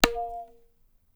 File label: tabla; Dirt-Samples